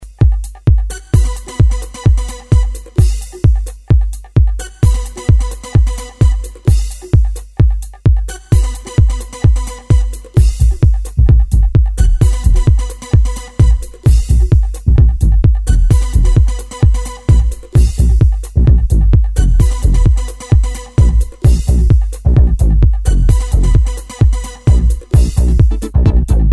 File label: Acid Beat SMS